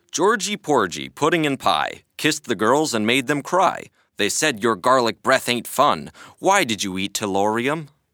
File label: Tellurium Element Jingles